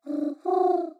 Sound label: Bird Cicada Notification Midday; Xiaomi MIUI 11